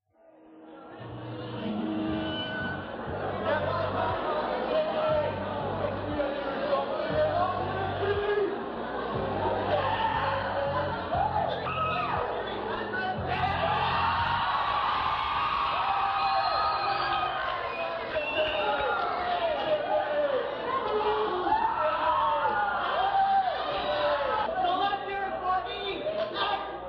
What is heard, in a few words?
audience